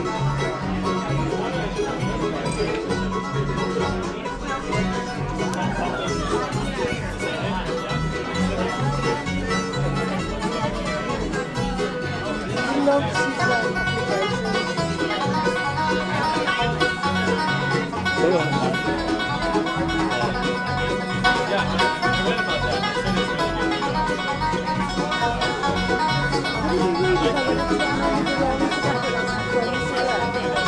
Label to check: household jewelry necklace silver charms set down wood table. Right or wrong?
Wrong